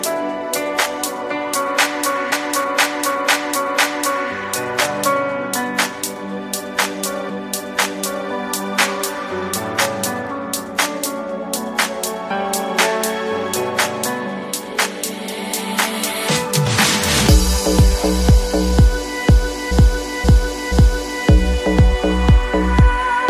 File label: beatdrop